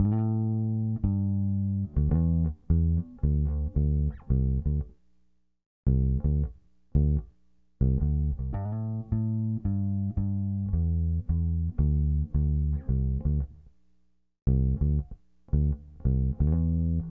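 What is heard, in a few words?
bass 56 D minor; Best Service ProSamples vol.34; Ballad de Boo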